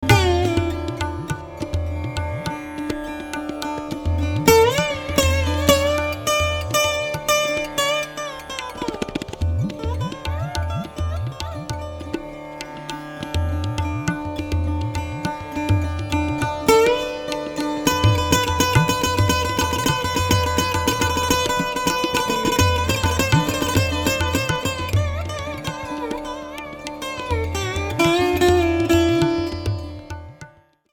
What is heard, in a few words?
SITARKHANI; Mishra Kafi